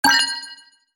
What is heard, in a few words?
Online item purchase e commerce